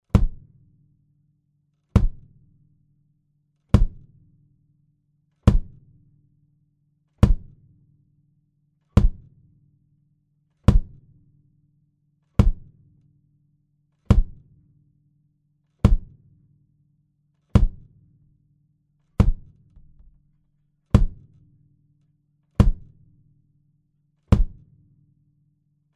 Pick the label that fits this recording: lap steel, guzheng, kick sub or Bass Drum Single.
Bass Drum Single